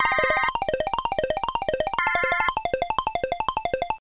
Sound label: Long Hallway C - Lovely